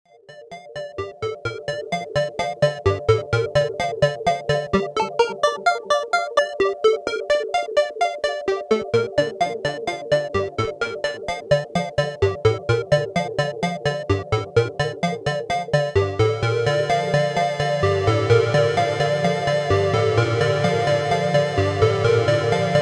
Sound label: arp; superjx